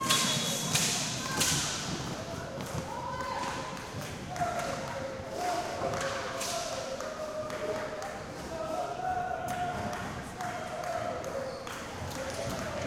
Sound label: Feminine League Match, Roller Hockey Match Ambience